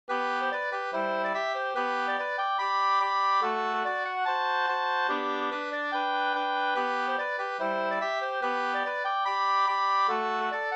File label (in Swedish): schottis; Luffarschottis e Viksta-Lasse Up nr2 2st V1